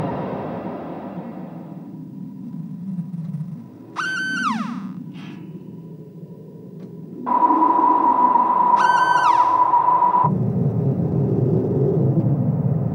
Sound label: space encounters sounds